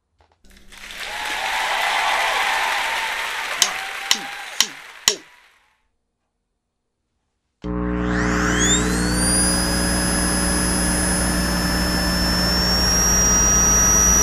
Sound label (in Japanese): soujiki